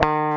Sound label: GTR EL GTR C, Clean E.Gtr, Velo Chord; Roland L-CD701; Clean E.Gtr3